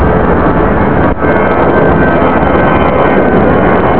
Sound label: Airbus